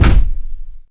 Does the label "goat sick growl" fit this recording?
No